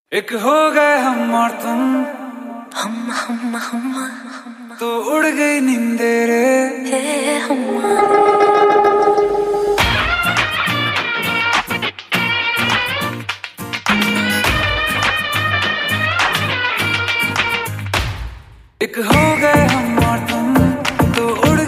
Flamenco Remix; The Dance Project Season 1 - Episode 2 (2018)

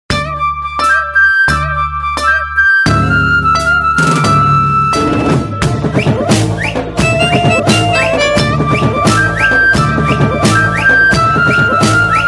Instrumental Tone

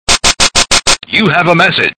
3D Message, SMS Tone

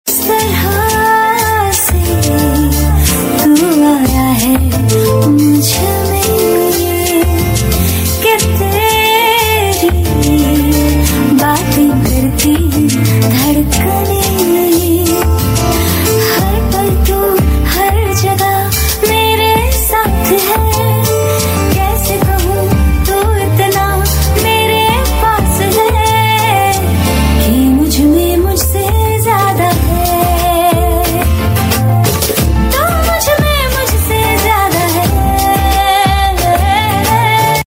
Female; TV Serial Tone; Sony; Kuch Rang Pyar Ke Aise Bhi

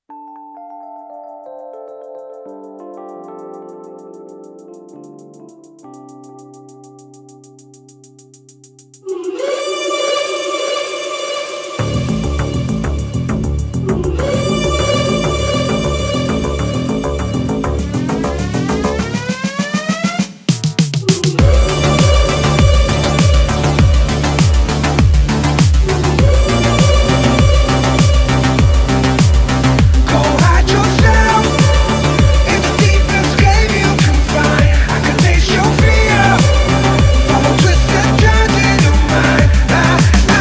Theme - Instrumental

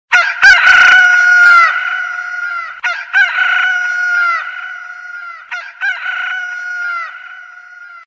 Rooster Echo

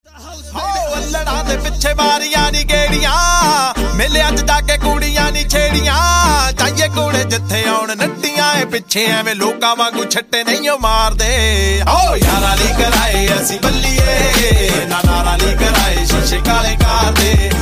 Tinted windows Ringtone

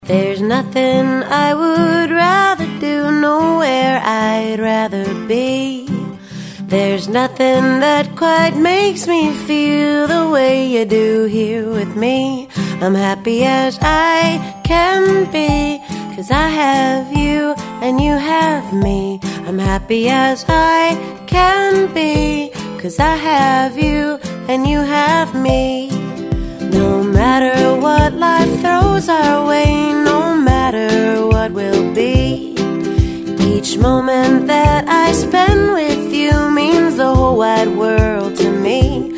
Coca Cola Building TV ad song

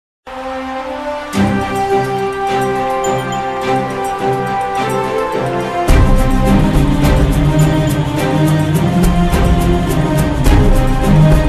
Pandavs Sad Tune; Tv Serials; Star Plus; Mahabharat

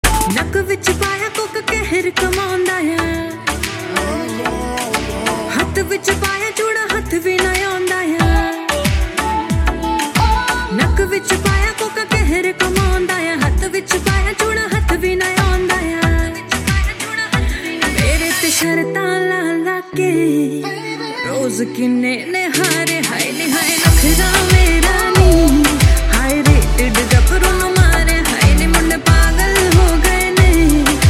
Female Version Ringtone; Bollywood; Nawabzaade

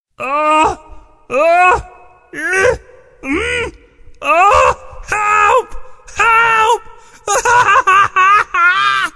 Help Massage Tone

